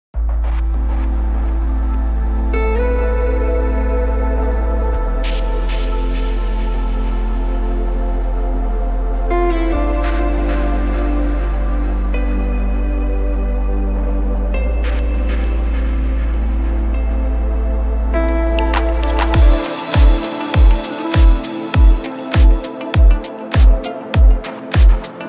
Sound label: deep house remix